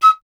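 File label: FLUTE PICCOLO D; Miroslav Vitous - Solo Instruments VOL-2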